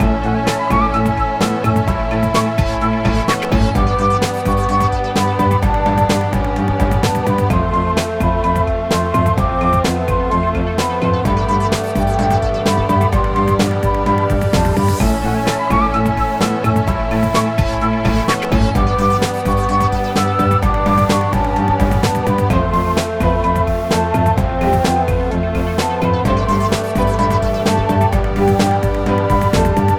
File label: World Map Flutey; castle crashers